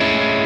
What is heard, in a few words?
x 5 6 6 x 5 Amaj7